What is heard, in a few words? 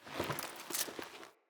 Foley Move Short; Friendly; Deora